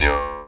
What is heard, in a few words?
D JAW, Primitive Instruments